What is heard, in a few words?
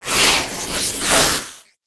Deathweb Spider Play